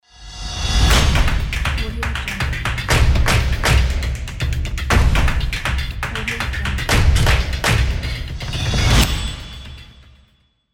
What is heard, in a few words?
drums logo